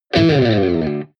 electric guitar distorted slide down

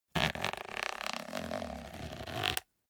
zipper small case open slow

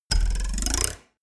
ruler twang

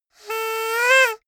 hoot rise

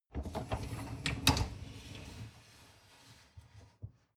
dishwasher upper tray draw out

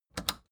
record player power click off fast

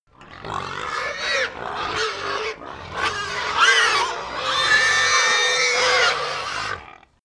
pig herd scream short

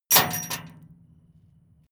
metal chain impact container

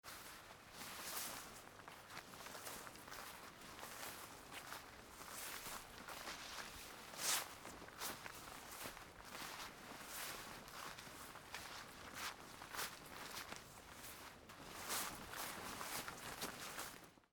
steps on grass in a forest

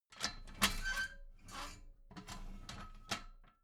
metal ladder small handle unfold soft

